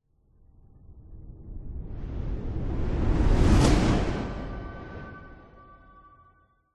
sound design cinematic whoosh into slam impact dark